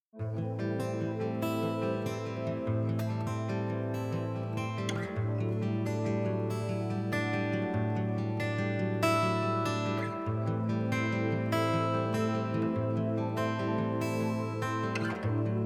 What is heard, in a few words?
Guitar Idea